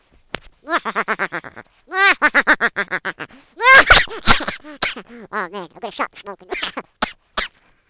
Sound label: Smoker Cough